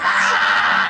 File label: "ah", filtered